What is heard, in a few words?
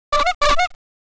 honk honk